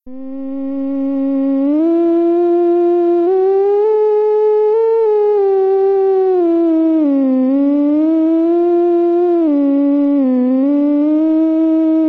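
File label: upper register, theremin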